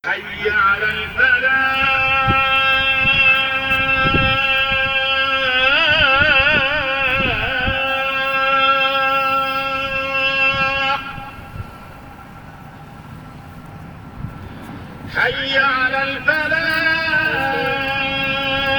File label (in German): muezzin sheikh zayed moschee abu dhabi